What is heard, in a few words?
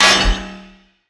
metal impact; soundscrate